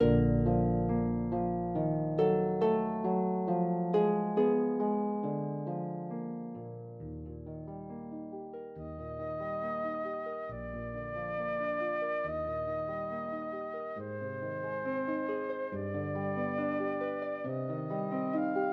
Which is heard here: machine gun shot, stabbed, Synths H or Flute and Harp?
Flute and Harp